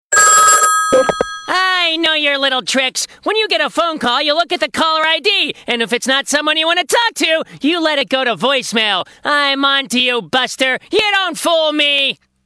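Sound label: Old Desk Phone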